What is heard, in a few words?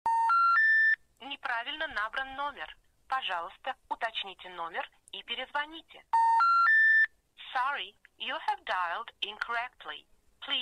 telephonn robot